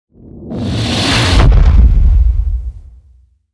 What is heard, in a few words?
hit swell; Ninja Tracks; bronto